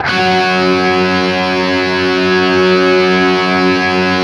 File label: 5TH F#3, guitar; 5THS LOOPED; East West; Steve Stevens Guitar Samples Collection